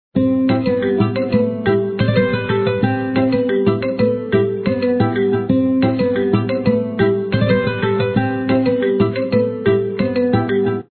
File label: tea party music; glamorous tea party